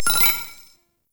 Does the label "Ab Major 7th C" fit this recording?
No